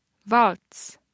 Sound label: waltz